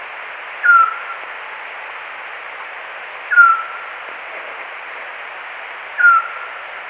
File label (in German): zwergohr